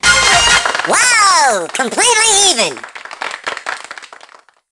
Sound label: Battle Mode Stage 4 Intermission